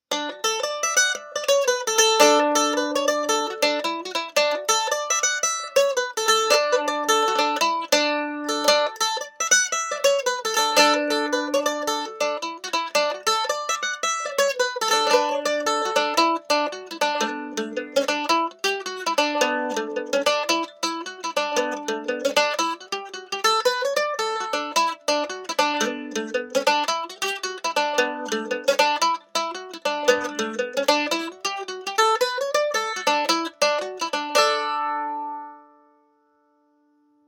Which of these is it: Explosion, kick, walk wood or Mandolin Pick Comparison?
Mandolin Pick Comparison